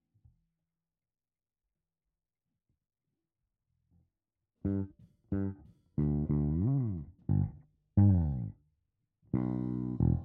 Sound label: Bass RE.12